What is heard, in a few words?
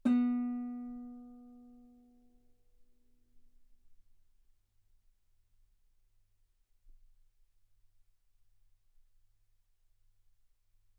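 Guitar ff B string B3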